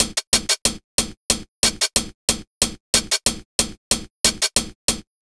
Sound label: DRMSND, Pure Electro, BEATKIT